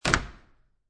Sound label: Door Close, Metal Door; Household; Doors